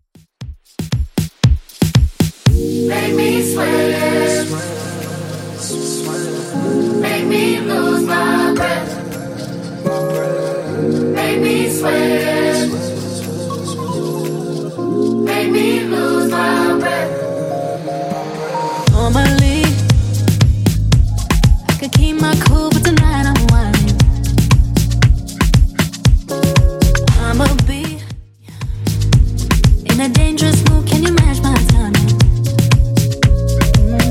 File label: Top40 Redrum 117bpm